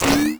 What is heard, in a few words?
mushroom light hit; Crypt of the NecroDancer